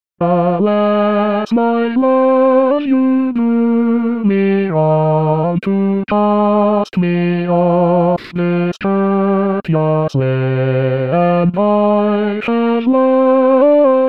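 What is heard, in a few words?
bass; Greensleeves; arr D Andrews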